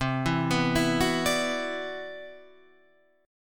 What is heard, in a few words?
C Major Sharp 11th, Guitar Standard; CM#11 arpeggiated; JGuitar